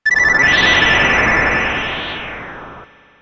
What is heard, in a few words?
snowy; SNES; mallow; SMRPG